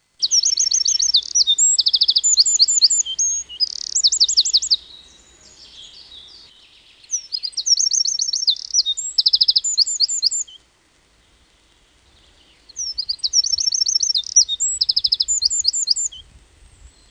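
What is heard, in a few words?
Wren; Madarak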